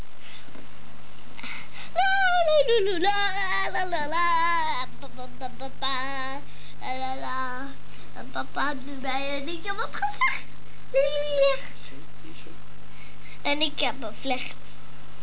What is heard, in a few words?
lalala